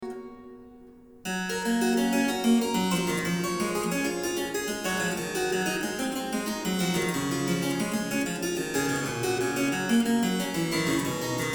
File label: Harpsichord